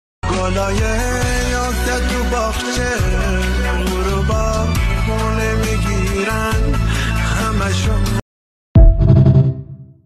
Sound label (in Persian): music, آهنگ کوتاه غمگین ایرانی